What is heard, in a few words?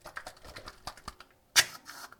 wood paint gun